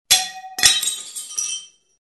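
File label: dinner plate sounds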